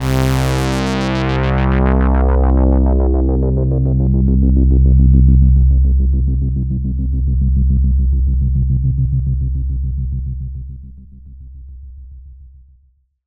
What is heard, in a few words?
pad c2, Synths; Polyend; Simulacrum Sounds; 1 Shots; ARP 2600; Pads